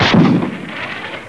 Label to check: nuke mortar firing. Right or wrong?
Right